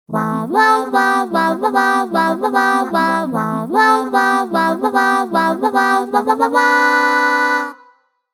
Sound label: wah-wah, ringtones; Sony; Xperia A SO-04E; Miku